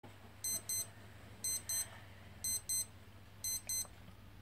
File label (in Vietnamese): bao thuc dong ho casio